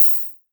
vermona drm1 mk3 hh

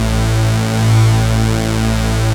saw lead synth; Techno Trance; Ueberschall; Techno Trance Essentials

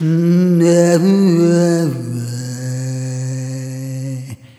Arrhythmic F

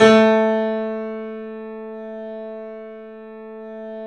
A, The Piano; InVision Interactive - The Piano

